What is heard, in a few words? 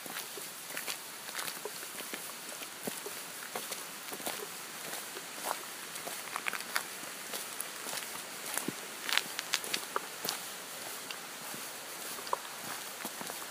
Stream Hike; Sleepwell